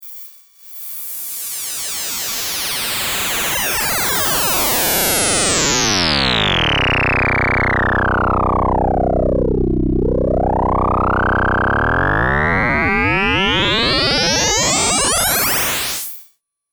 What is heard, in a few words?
Noise Fake; Dark Energy